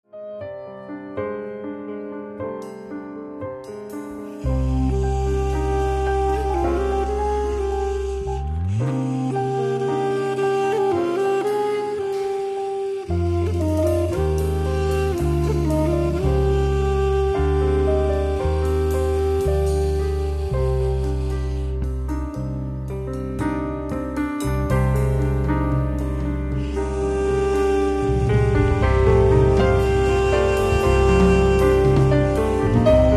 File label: acoustic quartet blues